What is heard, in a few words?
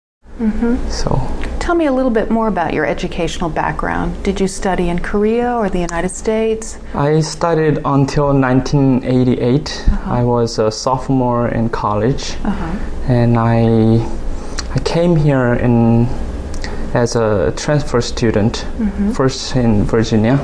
Sung